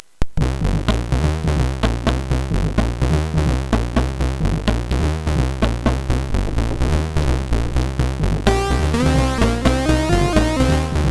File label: Short n Scratchy, sid MOS6581R4; Deek; hvsc